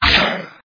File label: fishman attack